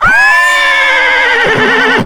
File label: Centaur Death